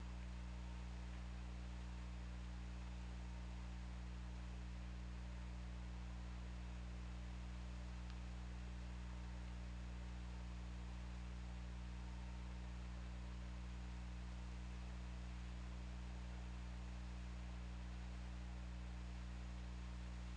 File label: Orca; OrcaLab